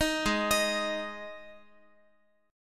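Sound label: A flat 5th A flat Guitar, chord; arpeggiated; x,x,x,8,8,10; JGuitar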